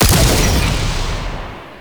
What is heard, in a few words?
Rifle Alt Fire Shot; Weapons; Warframe; Corpus; Ruban